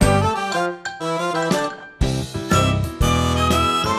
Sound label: strolling music